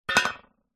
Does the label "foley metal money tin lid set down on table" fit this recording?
Yes